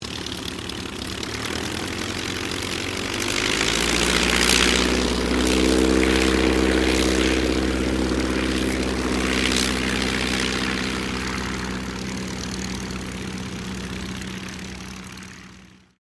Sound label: AIRCRAFT DH82A DEHAVILAND TIGERMOTH TAKEOFF; Mixed selection of Tigermoths from the 1940s